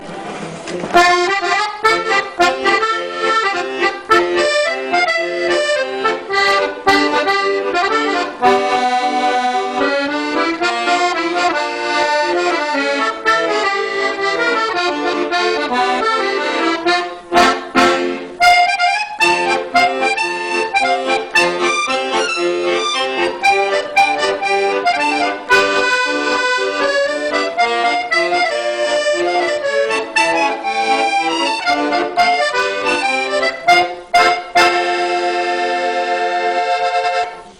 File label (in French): accordeon